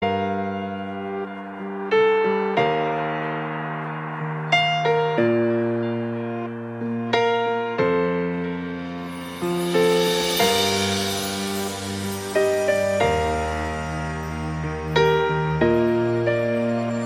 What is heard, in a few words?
Piano Ringtone